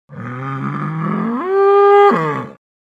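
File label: sounds of bulls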